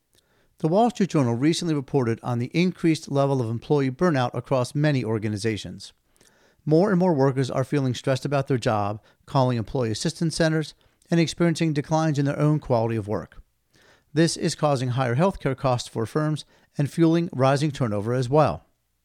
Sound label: Blues